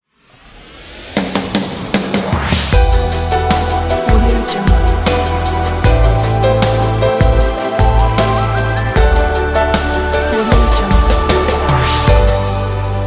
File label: adult contemporary ident; charismasound